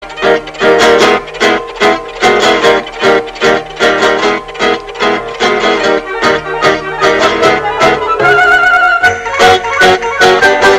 Ukulele; Tom and Jerry

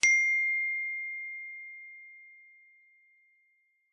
Note iPhone Notification

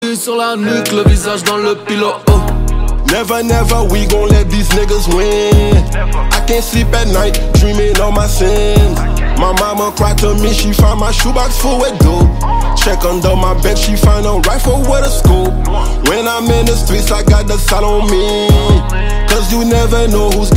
Piccolo

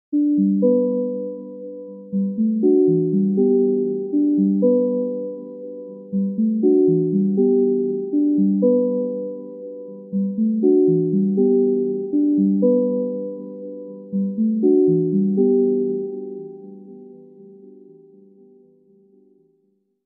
Healing Key HMD, sonnerie

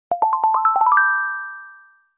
Samsung S24

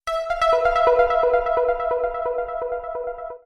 Energizer E242S Plus SMS

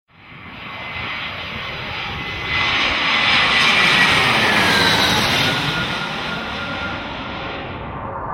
Aircraft Noise

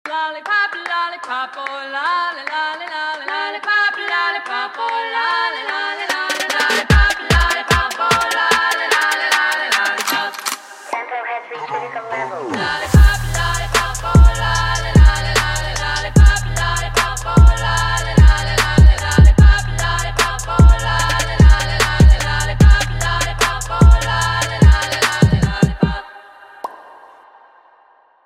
trap remix

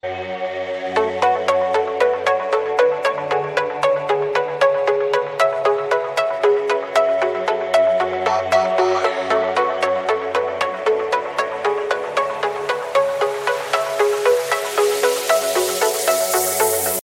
Samsung Galaxy Y Pro Default

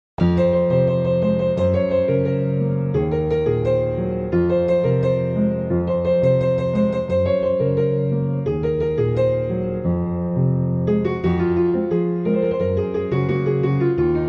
Piano

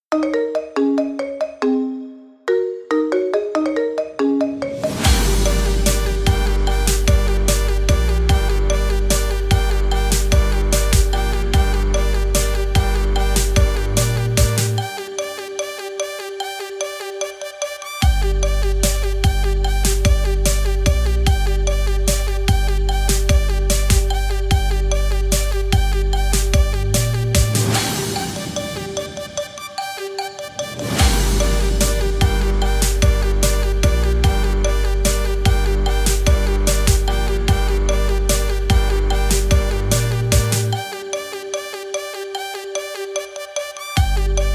Marimbar Remix

